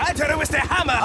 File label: hammer